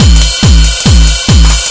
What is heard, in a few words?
tec, bases140